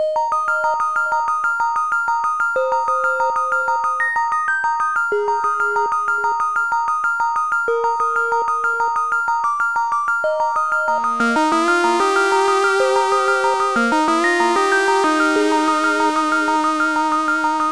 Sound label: keygen song